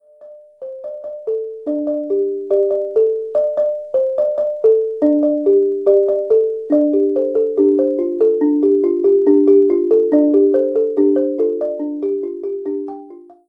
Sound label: balafon